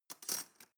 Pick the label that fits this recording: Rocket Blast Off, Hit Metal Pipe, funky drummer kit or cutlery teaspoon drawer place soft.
cutlery teaspoon drawer place soft